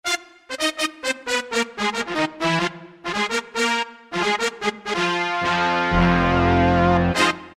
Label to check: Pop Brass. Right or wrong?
Right